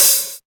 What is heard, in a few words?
open hi hat sample d key, Royality free open hat sound tuned to the D note. Loudest frequency: 8798